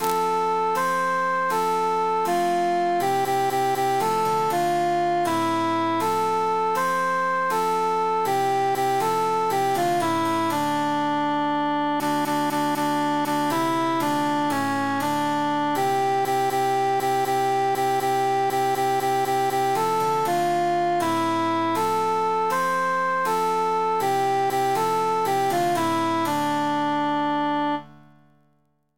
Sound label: cantus emphasized; pase el agoa